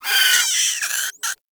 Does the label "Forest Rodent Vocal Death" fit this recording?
Yes